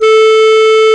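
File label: clarinet; FMA CLART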